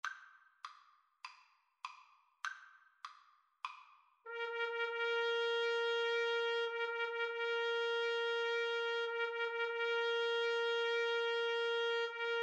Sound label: Trumpet Saxophone Duet; mendelssohn wedding march